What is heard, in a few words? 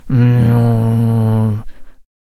Deadeye Attack Vocals